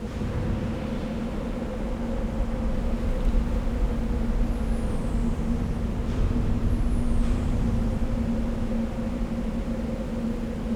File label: cave, ambience